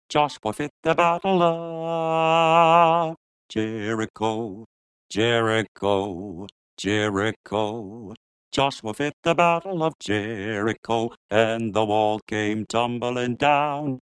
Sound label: Jericho F section bass lowered; bass part - dropped 1 octave